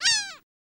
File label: Seagull Laugh Single